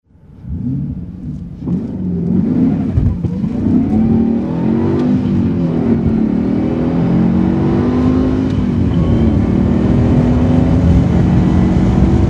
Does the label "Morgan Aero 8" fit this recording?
Yes